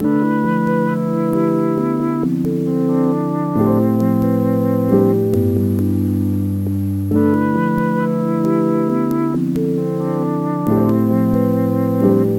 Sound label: 135 Am